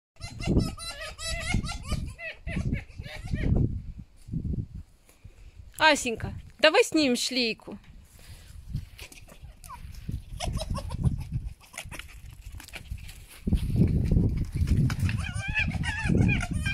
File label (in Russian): vy slyshali kak smeyutsya lisy; zvuki lisy